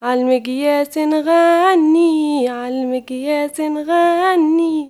GIRL, GIRLVOICE, Arabian Traditions

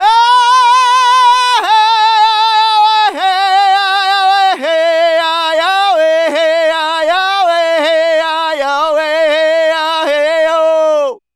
Native Vocals, Native American

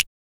JACK SNAPS

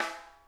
RIM, snare; Sonic Reality; Interactive Drum Kits

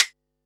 snaredrum, Pearl SC-40

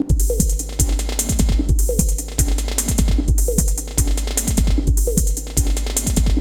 LOOP, Tekkno; NO FUN; Masterbits - Tekkno Trax; D LOOPS

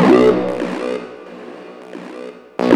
philter; Sonic Foundry (Sony Creative Software); Ugly Remnants VOL-2

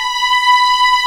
B5 LEG, String Collection; East West; The Ultimate String Collection

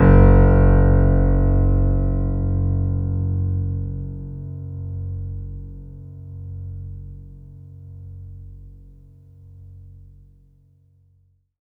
F#1, Synth Line; 90 s Sample CDs; NiceBeats; SynthLine VOL-22

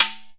conga, d#4; EMU E-III; Conga Battery

